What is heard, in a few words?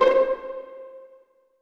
C 4 PIZZ M, HALL STRINGS; AKAI S-Series CD-ROM Sound Library VOL-7